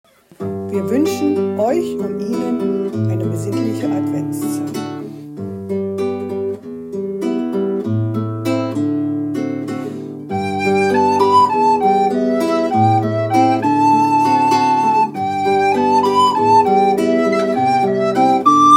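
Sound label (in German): adventslied gitarre flöte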